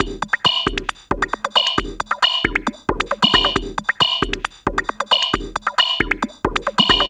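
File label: drum loop 135, left; House; Best Service ProSamples vol.10 - House; AKAI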